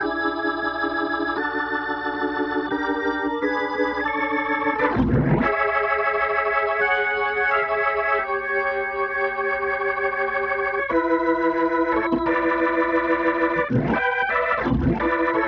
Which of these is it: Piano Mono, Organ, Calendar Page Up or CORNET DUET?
Organ